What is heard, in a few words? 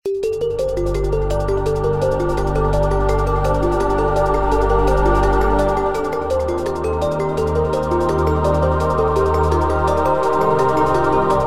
ultra ambience